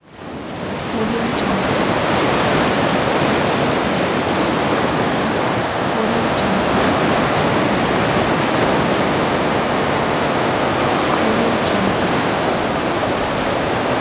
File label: sea waves sound